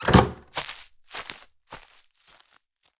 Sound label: Door Close Walk